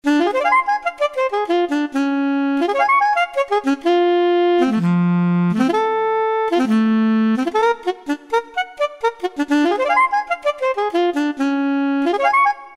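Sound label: orchestra Soprano Sax & Winds, E-mu PK-6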